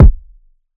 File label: Kick